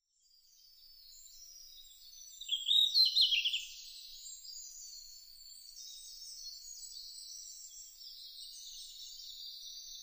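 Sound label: red-flanked bluetail; ruribitaki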